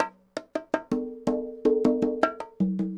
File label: cong bongs 80, latin, 80bpm